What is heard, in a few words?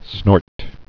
snort